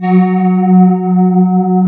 CMI ORGAN

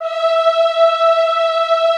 EHH E4, Classical Choir; Best Service

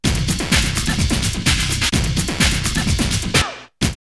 drumloops Rave 127; X-Static Goldmine; 90 s Sample CDs

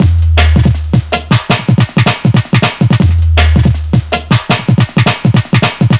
160, Drum Loops; Total Drum&Bass

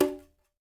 BRSH CGA, CONGAS+BGOS; Spectrasonics; Liquid Grooves 1 & 2

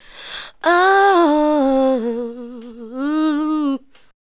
ADLIBS 90; Ueberschall; Jam Box

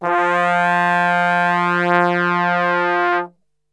F 1 Brass Super Section; Best Service; TRMBSTRGHT; 90 s Sample CDs

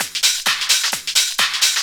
130 addon, DRUMLOOPS, HIHAT; Ueberschall; Techno Trance Essentials; LOOP ADDON4

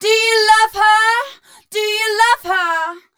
f mel a flat, fem Vocals

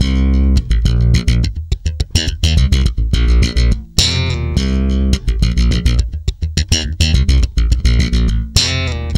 TWEAK C, Bass; Spectrasonics; Bass Legends

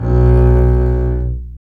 STR ARC BS, Cb Bowed, Cb1 mf vb; String Master Series

